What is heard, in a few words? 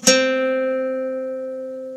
Guitar C